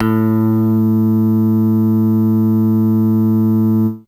Electric Bass, FAIRLIGHT; Best Service ProSamples vol.55 - Retro Sampler

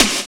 SNR, Drums; AMG; Now CD-ROM (Roland)

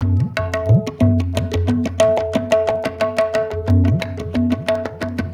Ambi Groove

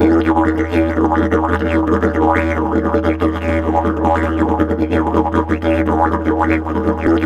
E BURBLE, Didgeridoo; Big Fish Audio - Didgeridoo and Other Primitive Instruments; BURBLDRONE

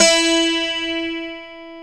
E-3 ON, MINI 12 GTR; AKAI S-Series CD-ROM Sound Library VOL-7

